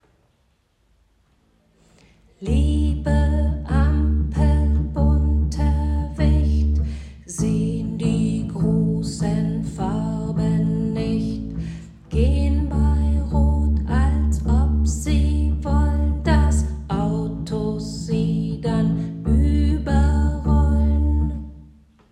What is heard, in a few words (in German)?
Bass pizz; gezupft